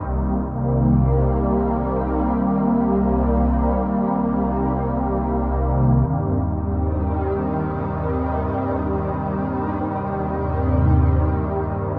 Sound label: SYNTH PAD AMBNT 160 C, Ambient; Sphere